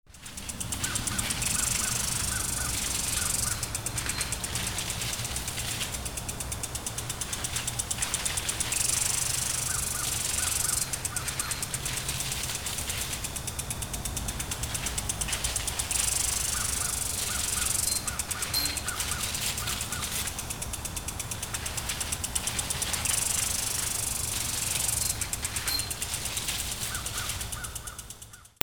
AMBIENCE

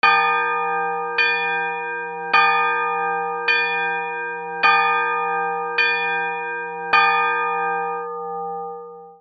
BELLS

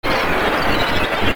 SCIFI

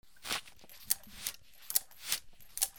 GARAGE

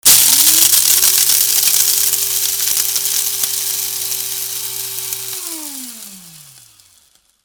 KITCHEN

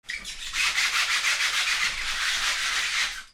FRICTION

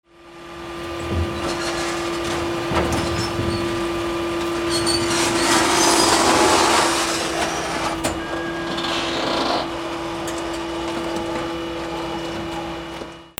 VEHICLES

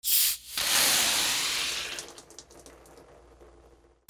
HOUSE

